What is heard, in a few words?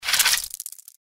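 sting movement; npc; cazadore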